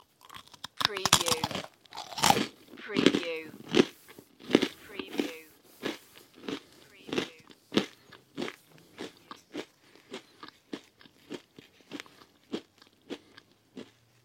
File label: Person eating dry crisproll, crunch, chew, munch